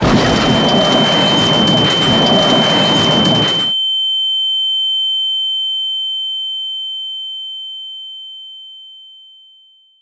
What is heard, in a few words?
crash